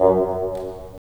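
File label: WND BN, wind bassoon; LA Composer vol. 3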